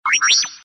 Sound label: wrong; STAR WARS